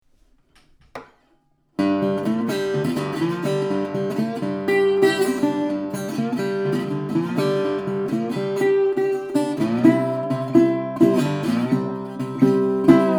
national resorocket, steel-string resonator guitar